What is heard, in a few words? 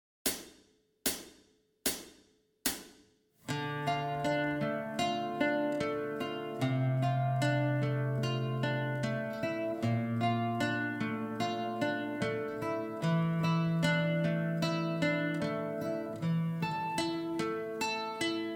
intro backing track 75bpm, guitar